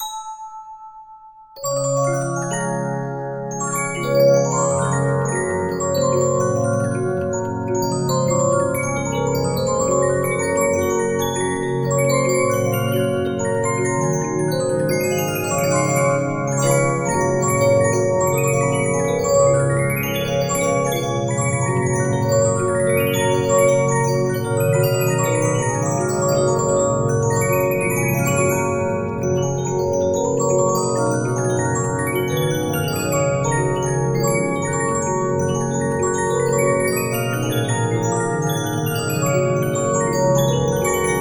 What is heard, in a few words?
Regina, 15inch disc musicbox